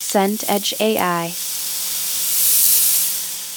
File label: sizzle gentle onions